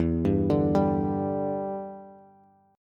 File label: E Minor 7th, down, Bass; arpeggiated